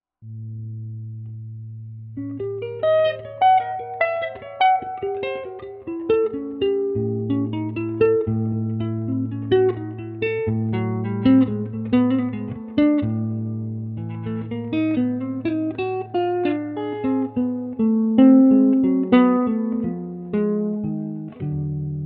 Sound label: improvisation, solo guitar; Ted Greene